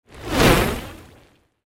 Torch Swoosh, Torch Swoosh Movement Pass By Heavy Crackle; Flames; Flame Sound Effects